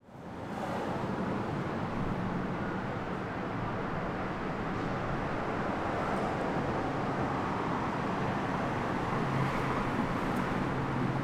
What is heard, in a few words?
City Exterior Pedestrians Cars By Siren; Cities; 2496sfx